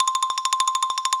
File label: counter loop; santa vs rudolf